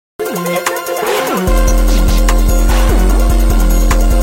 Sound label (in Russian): kapli krovi